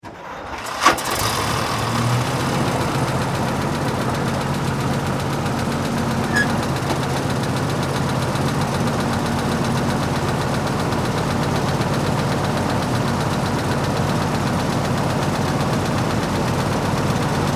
Vehicle Tank Half Track Start Up Idle Turn Off Military World War II